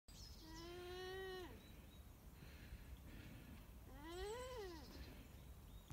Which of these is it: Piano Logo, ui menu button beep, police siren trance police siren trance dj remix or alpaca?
alpaca